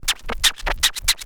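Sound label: scratch, record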